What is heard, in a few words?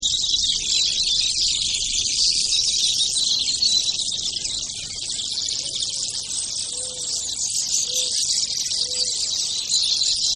Desert Birds Owl; Nature Scenes; Environmental; Partners In Rhyme